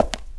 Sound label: walk; Earth and Beyond; EA Games